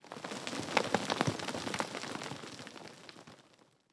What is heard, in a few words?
impacts; World of Tanks